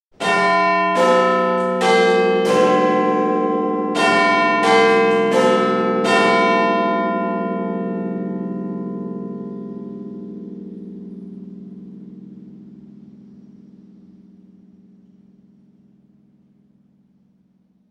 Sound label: Big Ben chiming half past the hour; Clock Sound Effects; Machines; BBC